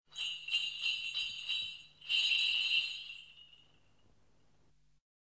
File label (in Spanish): cascabeles